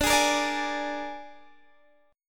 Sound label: Suspended 2nd Flat 5th; Gsharp